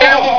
pain; quake2; sheep

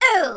bump; quake2; invader

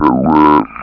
gurp; quake2; bunbun

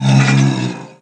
fall; quake2; makuta

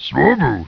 pain; quake2; orc

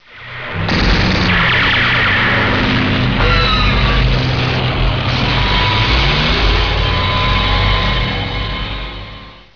dogfight; quake2

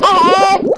gurp; quake2; babyboom

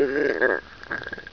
drown; quake2; sheep

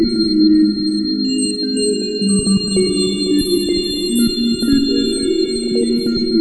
comp room; quake2; zepsounds

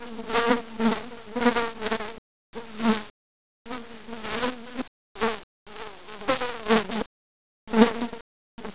flies; quake2; inland

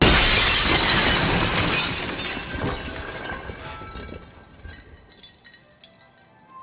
tension heli; quake2; spawngirlsounds

